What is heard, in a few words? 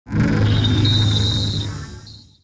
sorcery